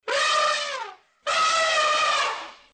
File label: elephant sound effects